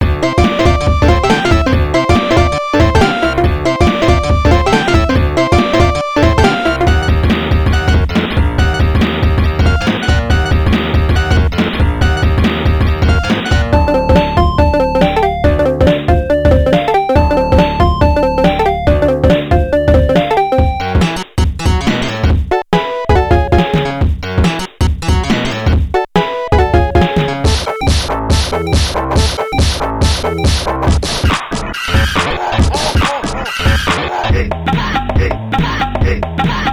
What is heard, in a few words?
Pocket Operator PO-133; Teenage Engineering